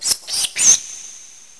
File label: rats; bayeux